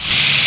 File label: slide